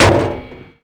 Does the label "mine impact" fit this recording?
Yes